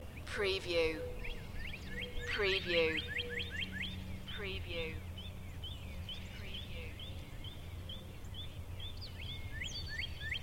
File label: Pretty Forest Birds Loop